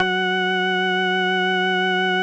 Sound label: F#3, ORGANS; Music Store Sound Service; Einstein